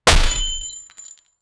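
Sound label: heavy greatsword being slashed, sword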